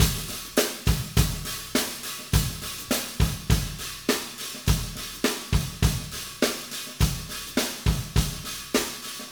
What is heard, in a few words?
Acoustic Live Drum; Platinum Audiolab